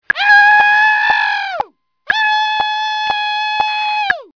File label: scream